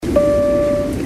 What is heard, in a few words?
bip